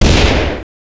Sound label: boss boom